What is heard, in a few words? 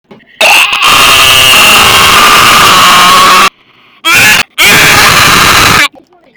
scream with me